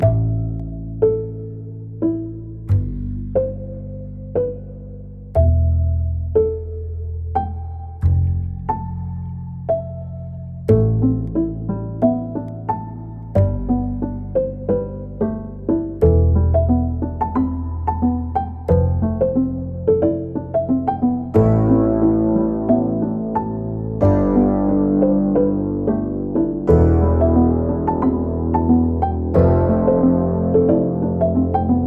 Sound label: Ending (Sad); Daigaku Gurashi